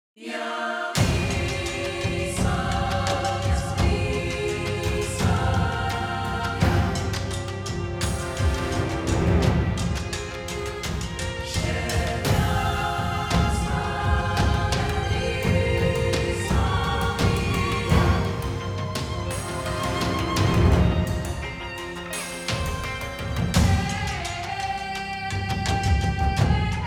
KONTAKT THIRD PARTY SONG FULL

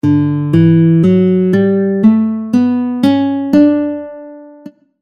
D Major Scale

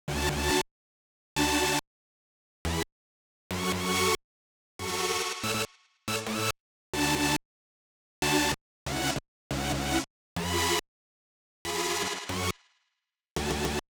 REMIX LEAD SYNTH SLICE

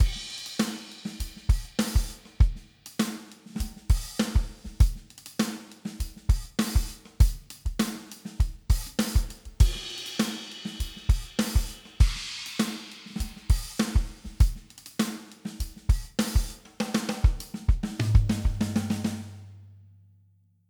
SMACK ATTACK DRUM OFF

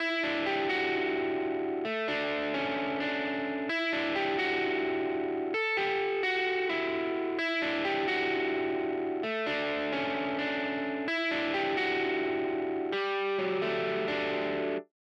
STEREO GUITAR RIGHT GT DRY

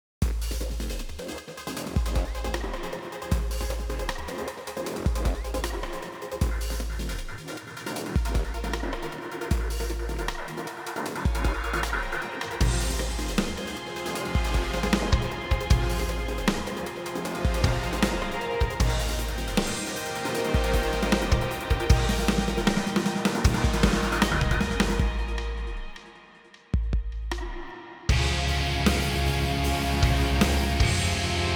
LOUD ROCK SAMPLES SONG

